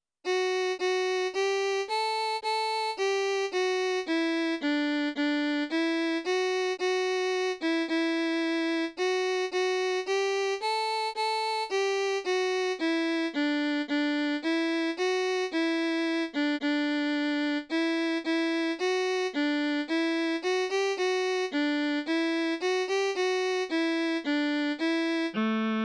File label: Full Orch